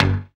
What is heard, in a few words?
ZOING, Synth Bass, Roland